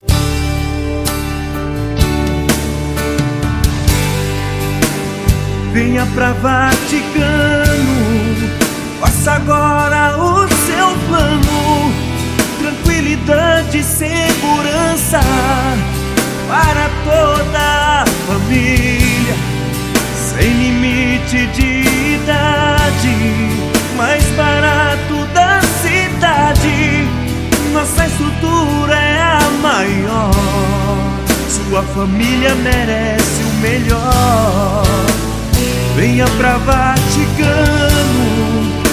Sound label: jingles